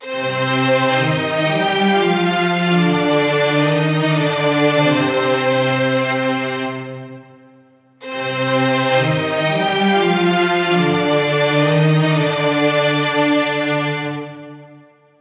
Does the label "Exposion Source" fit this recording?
No